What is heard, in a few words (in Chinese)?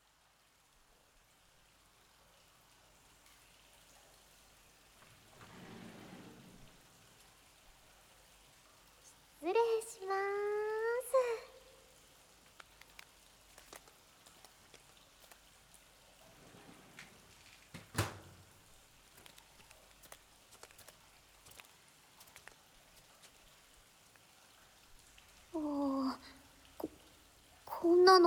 洗发露＆沐浴露; 后宫洗发露＆沐浴露